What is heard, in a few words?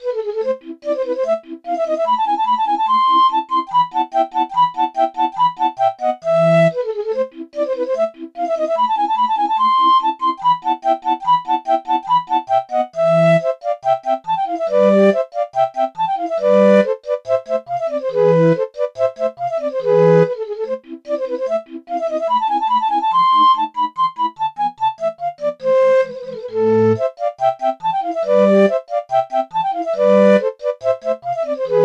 piccolo